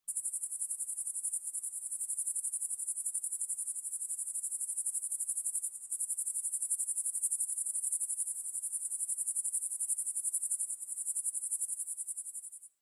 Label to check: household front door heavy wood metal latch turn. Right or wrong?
Wrong